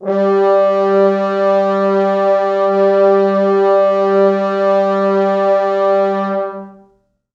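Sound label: 4 French horns sustain G2; East West; Quantum Leap Horns Sax; 4 FrHorns SUS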